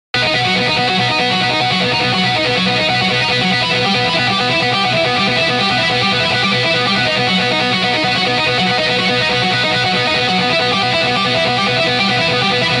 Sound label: Launchpad EDM Remake